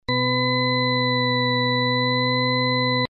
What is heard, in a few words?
tone mix; noise demo